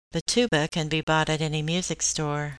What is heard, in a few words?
tuba